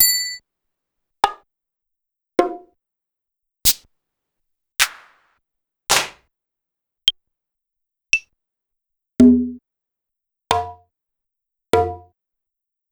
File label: Clavia DDrum AT Pitched Up